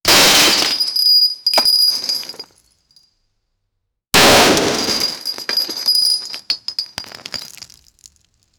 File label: lego